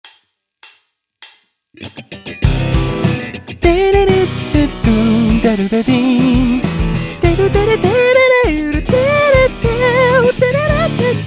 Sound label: vocal mix